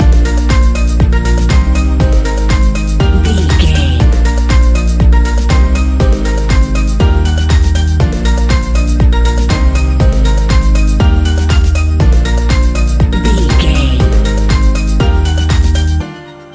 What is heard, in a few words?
A fast paced piece of club music perfect for the nightclub, and a youth orientated visual scene.